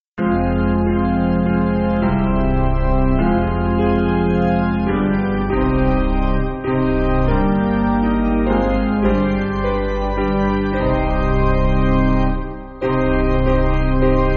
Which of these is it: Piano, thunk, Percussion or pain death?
Piano